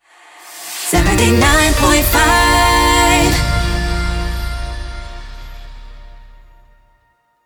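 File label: Sung Jingles Bb major 126bpm STING; 79.5 Heart FM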